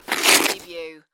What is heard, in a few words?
Snow and Ice, Ice scrape slide